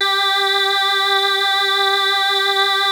WAVETABL1 G4; Music Store - Claudius Diemeter Schwerlos; DIG MYSTICS

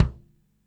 FX BD, Drums Acoustic; USB Soundscan vol.10; AKAI; 90s sample CD; ALL BD

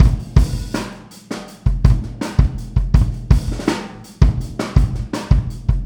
Boogaloo, Kick, 82 BPM; Drums; Verse; Sonic Foundry; Drums from the Big Room The Mixes

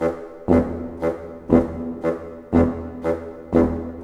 SNAR, Snare Army; East West - Scoring Tools

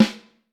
Vintage Snare Drum

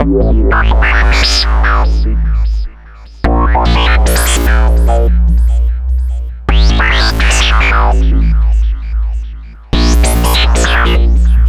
Synth Bass

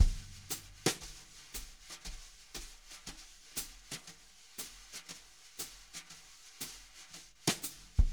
jazz brush loops

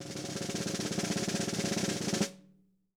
roll, drum

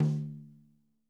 mid tom; drum

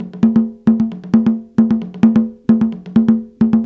132, Ju Ju; Spectrasonics; Supreme Beats; African Contemporary

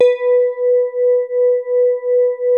SOFT H3, SYNTH PLUCK; Music Store - Claudius Diemeter Schwerlos

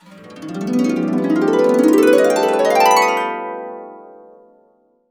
CASC UP, CASCADE UP, Harps; USB Soundscan vol.62; Complete Harps; AKAI

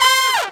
Unison Brass Hit; Proteus 2000; Multisamples; Digital Sound Factory Proteus 2000

